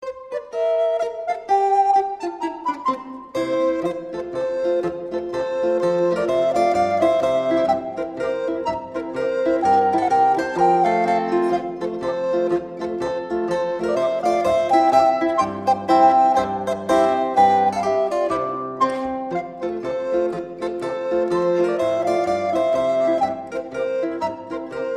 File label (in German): Schneider Polka, Zither